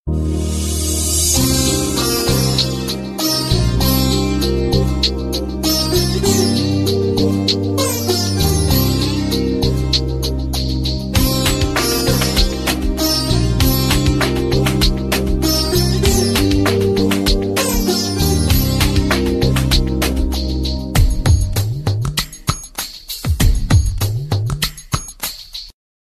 Heart Throb Ringtone

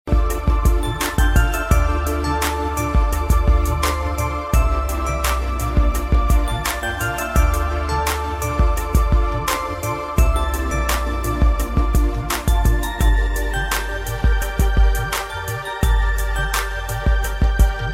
Cute Love Instrumental Ringtone

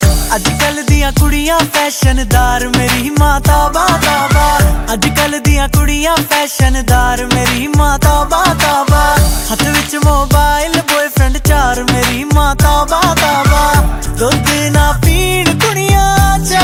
Jatt Punjabi Ringtone

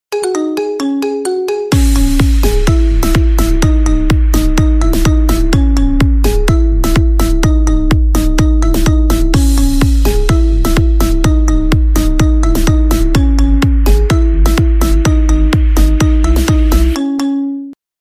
Marimba Ringtone

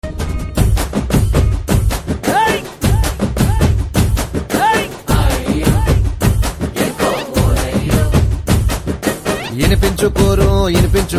Telugu Ringtone

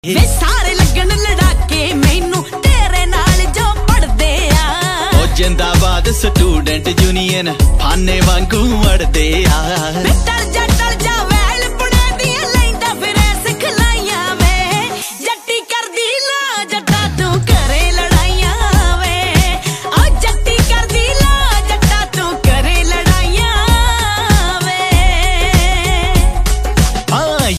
Punjabi Beats Ringtone

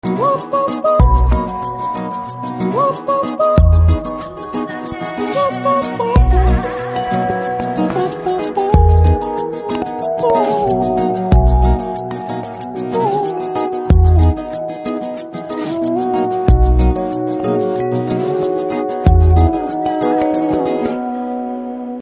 BGM Telugu Ringtone; World Famous Lover

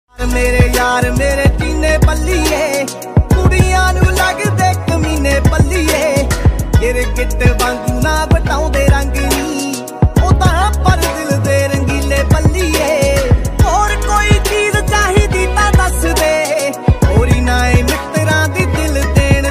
Desi Punjabi Ringtone

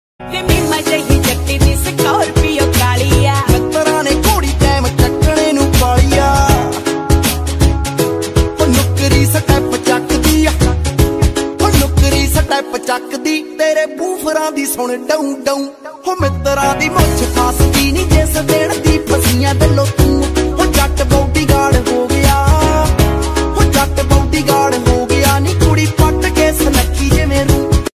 Punjabi Ringtone

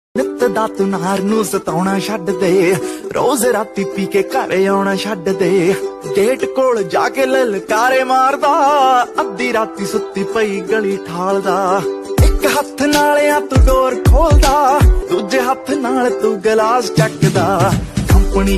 Dance Punjabi Ringtone

